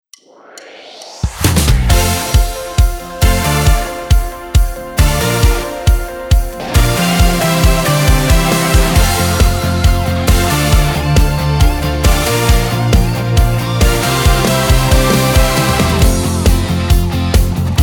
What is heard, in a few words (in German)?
Medley ohne Chor